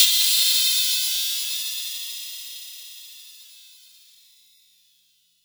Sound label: Metal